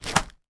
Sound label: sport swimming cap latex drop on floor; sound effects one